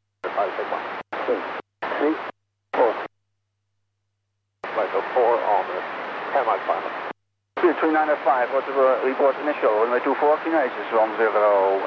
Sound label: swedish airforce arrival; swedish airforce 398